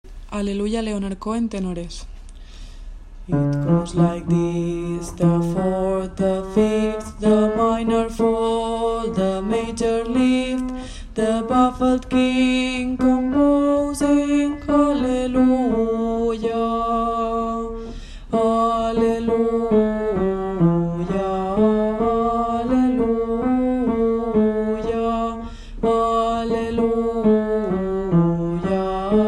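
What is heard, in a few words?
tenor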